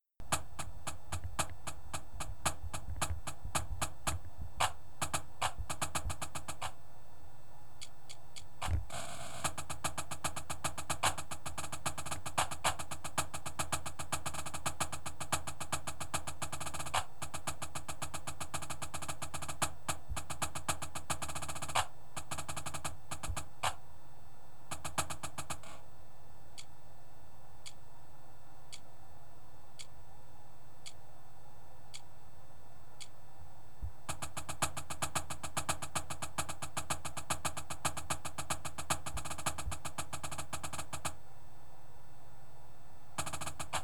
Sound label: snare, drumline